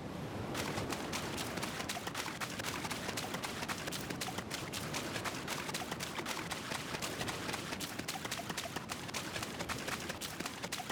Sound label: running water; dayz standalone